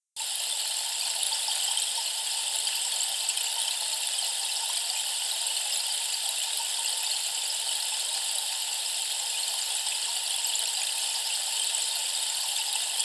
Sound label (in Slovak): dazdovy stlp 90 cm dub; harmonizacny